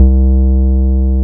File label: BAS MKS-50; Sound & Vision - Gigapack II CD 1